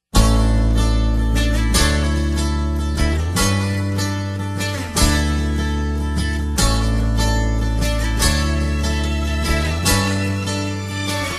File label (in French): accords